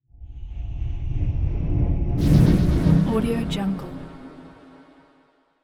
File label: fantasy magic shadow spell; esmteam